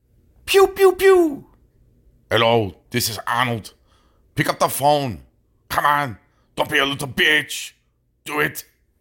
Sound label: notification